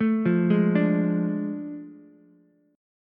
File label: A Major 7th Suspended 4th Sharp 5th, Ukulele, chord; AM7sus4#5; arpeggiated; JGuitar